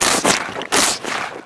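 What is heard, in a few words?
sucking spider loop, ships engine; Quantum Redshift; XBOX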